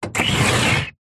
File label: household window thin garage sliding short slide open